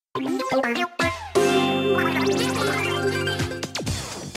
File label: News Jiggle; Splatoon